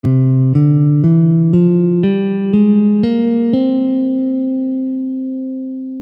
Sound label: Mixolydian b13 C, Guitar